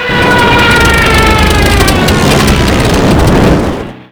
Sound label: henschel crash; WAR